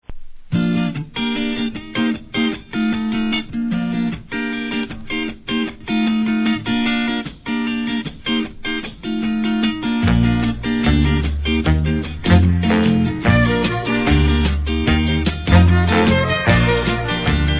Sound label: jazz